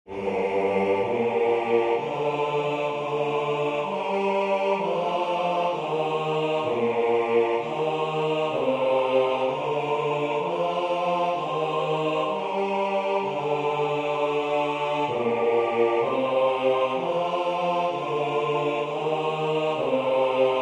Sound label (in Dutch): heer mijn hert engels; Heer mijn hert is boos en schuldig